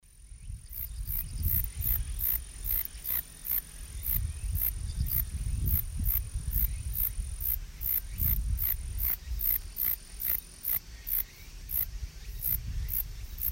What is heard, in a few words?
grasshopper